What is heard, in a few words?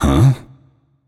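non verbal confused, voice; cernunnos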